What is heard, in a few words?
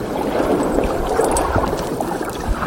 close key jingle; Biggs; biophony